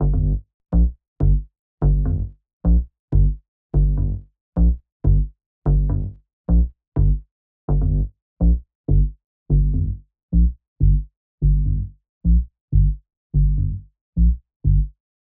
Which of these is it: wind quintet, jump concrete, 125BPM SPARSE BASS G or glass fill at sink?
125BPM SPARSE BASS G